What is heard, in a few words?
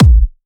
Trance Kicks, Bassdrums